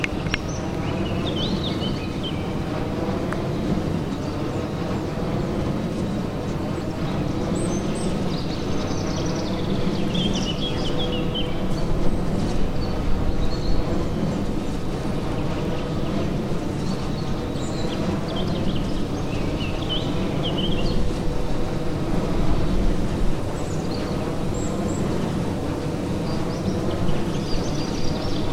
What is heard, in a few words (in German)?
tonaufnahme munderfing